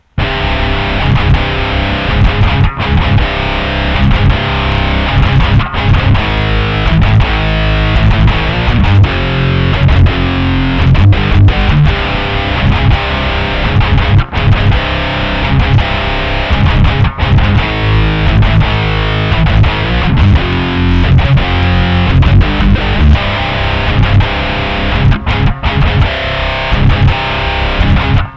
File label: Hellfire Drive